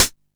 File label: Hat, Drum & Bass